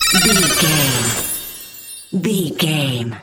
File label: magic device transform